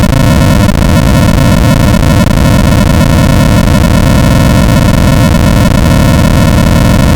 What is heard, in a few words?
noise 0F